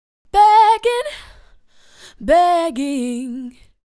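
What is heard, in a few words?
120 Bm, dance vocals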